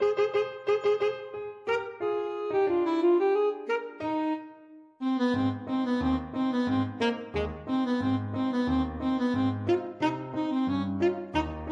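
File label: alto saxophone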